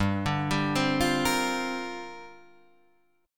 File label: A sharp 6th Add 9th